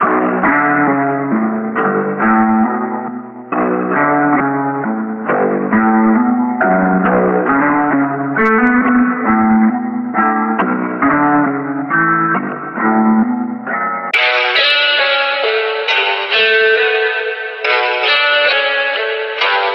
guitar

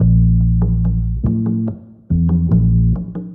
bass

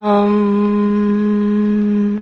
hum

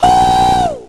"ooh" robot; ooh ahh

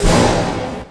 crash; Crash Bang Boom

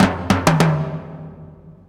Drum loops

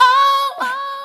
"oh" female sing; ooh ahh

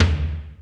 Toms, Real

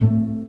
plucky, String; Notes

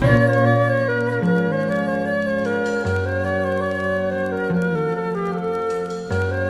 flute, Jazzmatazz loops

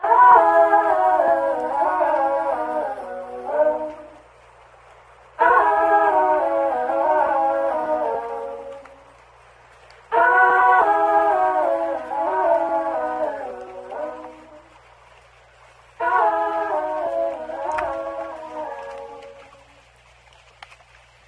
voice bathroom song; Loops; Phonky

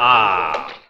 "ah" realize, Voice

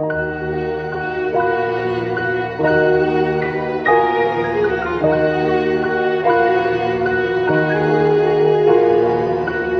piano and strings, sad loops

